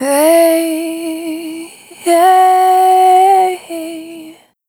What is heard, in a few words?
Single Voice Melodic Loops